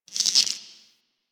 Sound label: Insect; Warframe; Hub; Liset Alchemy Lab